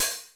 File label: cymbal, Roland MC-909